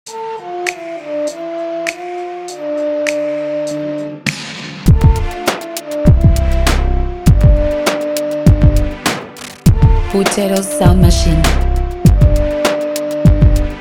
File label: urban mood